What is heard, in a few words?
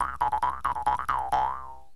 JEW'S HARP